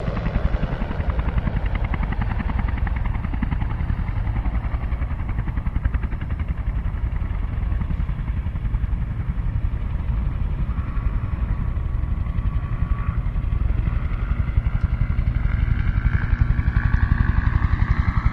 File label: Apache Hover Move Rig, ah-64 apache